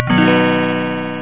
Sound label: Chime; Quotable Quotes 3.0